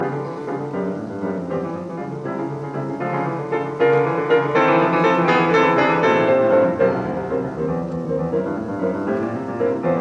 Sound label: Variations in C major on a Waltz; 33 Variations; Op 120; Allegro